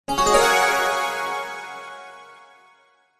multimedia game sound happy bright warm positive tone